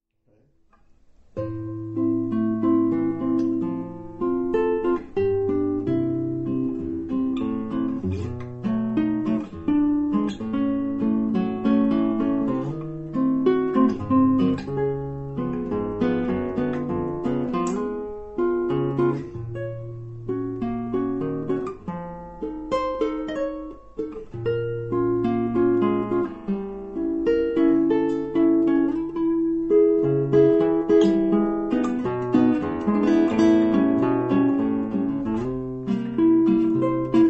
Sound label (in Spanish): Preludio; Tarrega